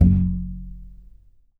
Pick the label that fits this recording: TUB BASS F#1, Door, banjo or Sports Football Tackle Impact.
TUB BASS F#1